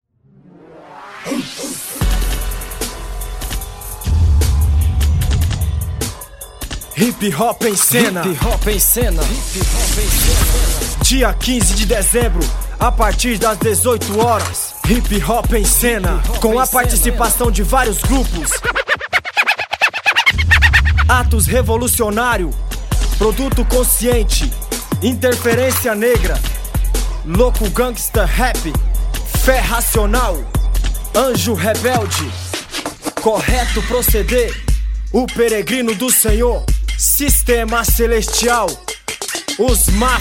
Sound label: hip hop